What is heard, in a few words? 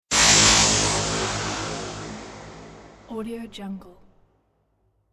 electric strike